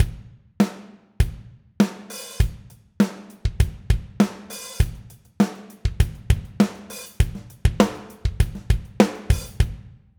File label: drums, interesting